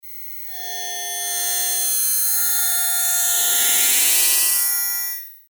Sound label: cluster feedback swell